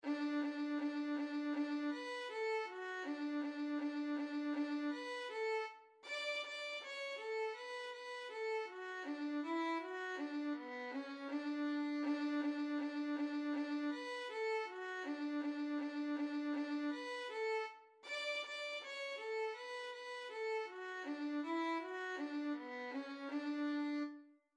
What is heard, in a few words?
Very Easy Violin in D Major Uses GDA Strings and Cut Time